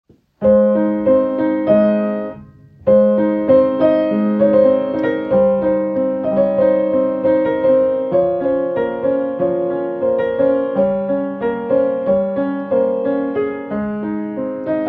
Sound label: Kawai